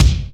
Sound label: KICK BIG; E-MU Formula 4000 Series Vol. 2